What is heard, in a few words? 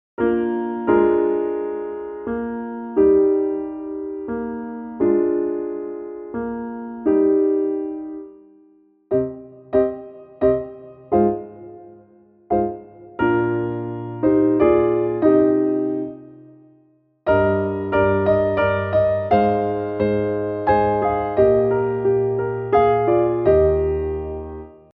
slower tempo piano accompaniment; Bei Männern welche Liebe fühlen